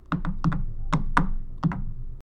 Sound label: Shoes Tapping